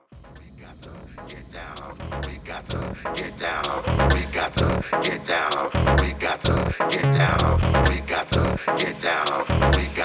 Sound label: shadow kick